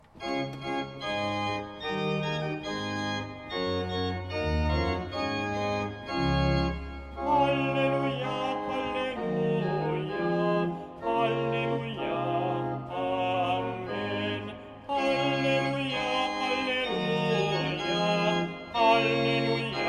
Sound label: Alleuia de la Résurrection; Lourdes